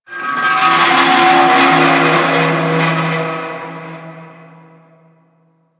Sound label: Ocean Long Metal Creak; Warframe; Grineer Ocean